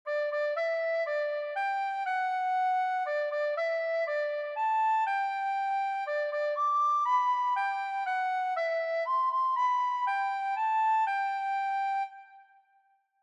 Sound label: Happy Birthday Recorder